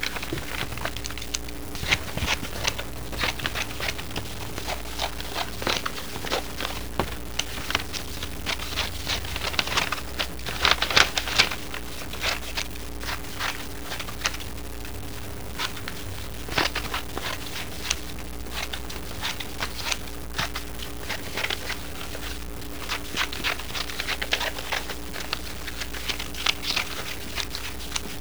SCISSORS

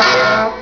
bonk, cartoons

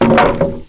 plank

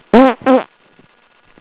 FART

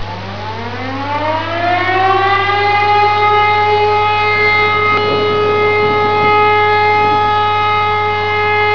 SIRENE

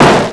BIG BOOM; WAR

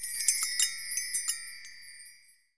SUCCESS; KRONDOR

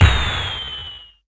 mortar, weapons

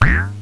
BOING

